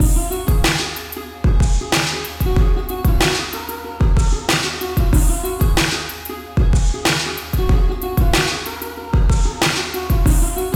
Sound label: Minimal Sarod